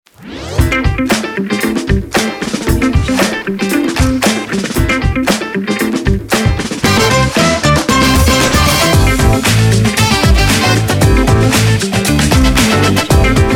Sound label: groovy upbeat funk opener; sergmaru